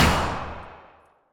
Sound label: drummer gun bang hot snare